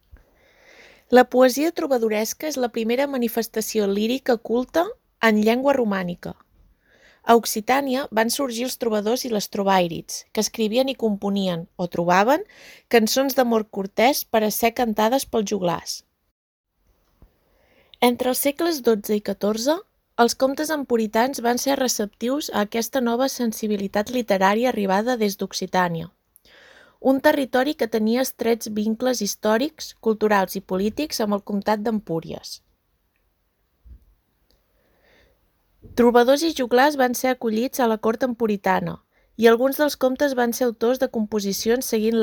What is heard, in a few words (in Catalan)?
Instruments musicals de la poesia dels trobadors, Instruments